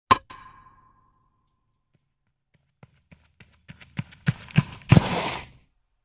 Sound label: Baseball Hit And Run